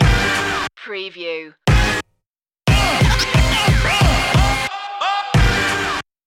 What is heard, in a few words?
boom bap stinger